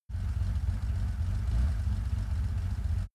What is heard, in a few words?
car porsche 959 idle engine